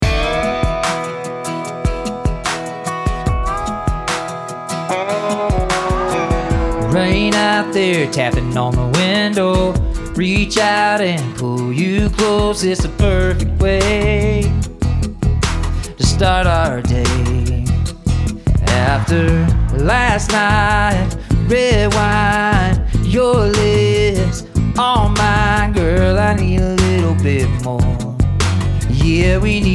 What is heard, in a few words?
Vocal